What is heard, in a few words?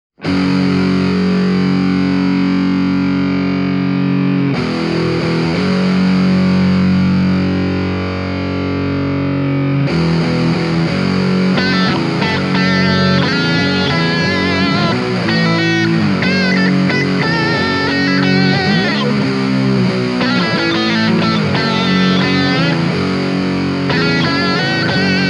ltd te 200 ec black metal demo song guitars only